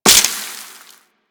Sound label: Forest Pinecone; Ambience; Props; Warframe; Grineer Forest